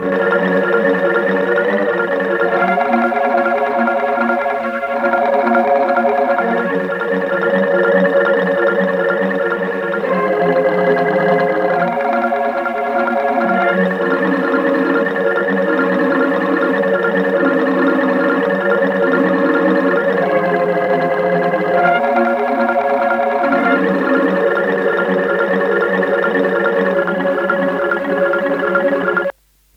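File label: marimba; marimbee; Polyend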